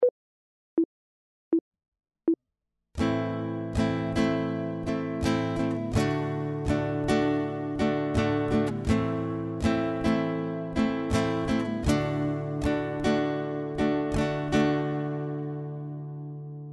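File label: chord, guitar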